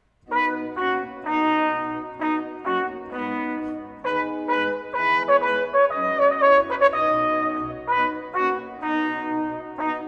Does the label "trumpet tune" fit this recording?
Yes